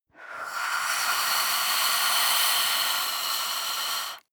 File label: breath female eerie hiss